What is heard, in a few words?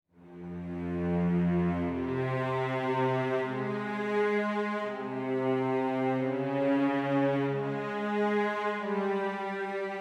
Melody in F min